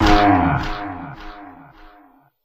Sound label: hit; HeavenStudio; sneakySpirits